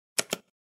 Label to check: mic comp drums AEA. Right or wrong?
Wrong